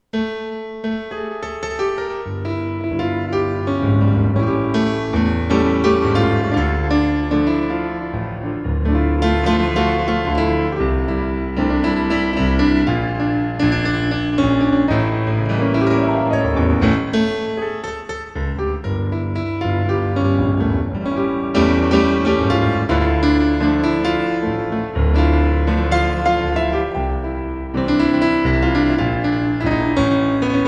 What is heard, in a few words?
sax